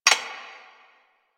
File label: Mug Clink; Warframe; Quests; TheNewWar; Ambience; Zariman